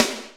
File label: Snares, Real